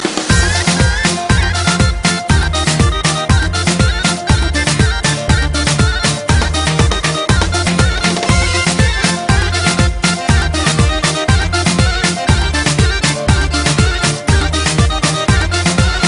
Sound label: Fast Mix Shqip